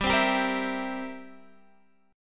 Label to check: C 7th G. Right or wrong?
Right